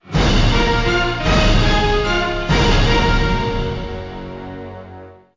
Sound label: Bgm Boss Clear; Ngds Boss Clear2